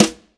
sfx perc

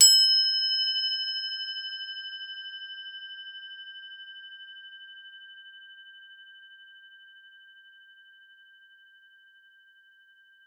CRO G4, CROTALES; Xsample VOL-4

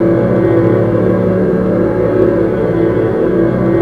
F#3 VOX; Northstar - The Wizard VOL-1; VOXTAGON

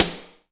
MIX SD; AKAI S6000 CD-ROM - Volume 1

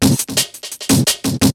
BREAKBEATS, TECHNO

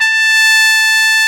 BRASS; AKAI S-Series CD-ROM Sound Library VOL-8

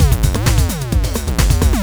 Beat, Drums & Percussion; Electrocution; Beats

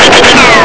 Laser Sound; ST-XX Amiga Soundtracker Sample Packs

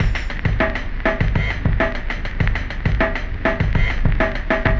Ghost Loop 100BPM, drum loop

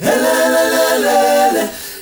HELELELE, Heart of Africa, CHOIRS; Spectrasonics; Heart of Africa 2

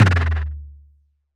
Bendir, Percussion; Roland BK-5

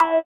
SITAR; Spectrasonics; Metamorphosis; INDIATEK

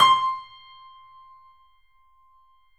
C, 3-D Audio Collection, 3D Pianos, Yamaha C7

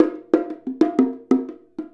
Bongo; Essential Percussion

